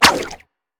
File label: basic attack; LoL; twisted fate